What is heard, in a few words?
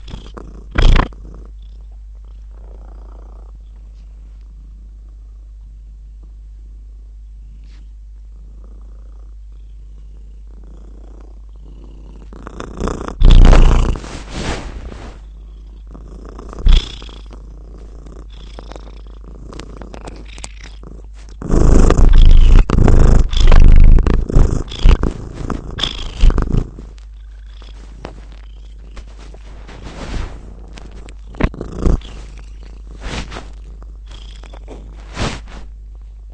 purr